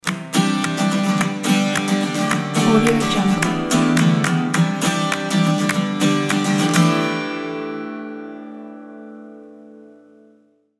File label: indie friends invited vlog logo ident